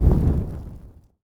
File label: fire stop; Rust